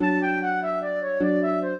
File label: trio, flute harp; Mozart